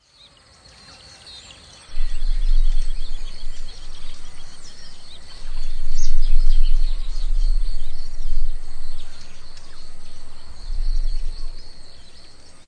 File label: dino steps